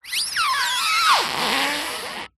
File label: vehicle wheel turn tyre squeak road close up isolated clean